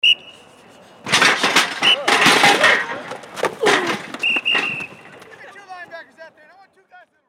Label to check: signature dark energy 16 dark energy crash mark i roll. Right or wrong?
Wrong